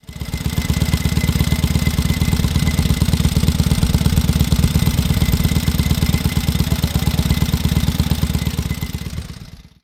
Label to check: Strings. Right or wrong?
Wrong